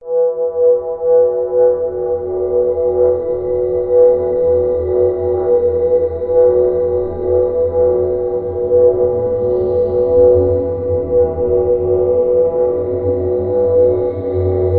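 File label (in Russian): мистическая атмосфера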